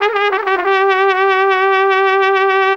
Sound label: TRUM, Gipsy; Best Service; Ethnic Journey Sound Cube